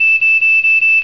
piepjes

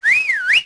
fluiten

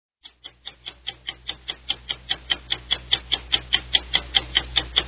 tickklokje

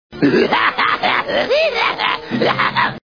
lach

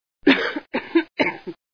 hoesten

